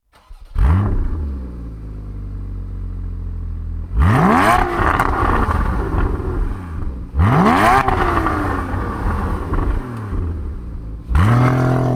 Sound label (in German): Sound Boxster GT Cayman GTS